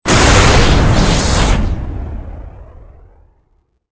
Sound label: sonic, stun grenade; weapons; Soldier Of Fortune II Double Helix; XBOX